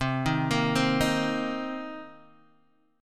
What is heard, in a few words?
C Minor 7th C Guitar Open D